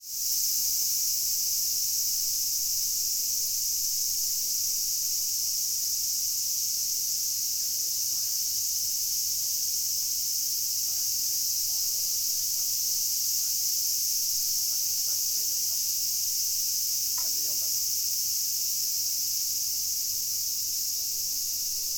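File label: cicada, Fukushima University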